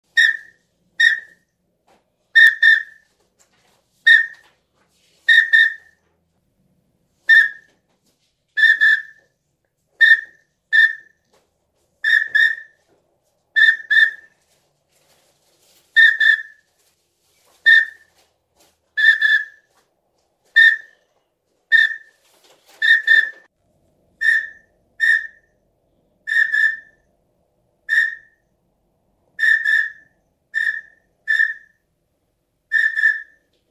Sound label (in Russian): шилохвость селезень